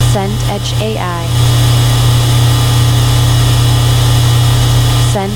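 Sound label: ambience laundrette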